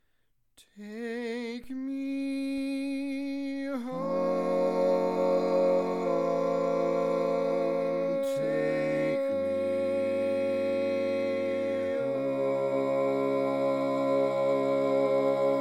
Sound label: barbershop tags, all parts